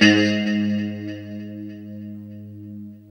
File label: LO-E G#2, Guitars; Spectrasonics; Hans Zimmer Guitars VOL-1; JC-SHEEN